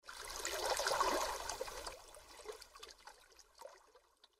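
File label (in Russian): нежная волна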